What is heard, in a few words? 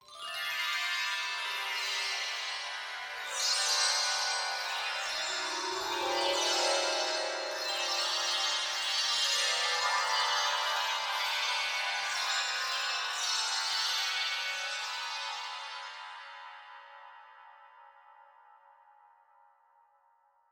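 CHRISTMAS Magic Chimes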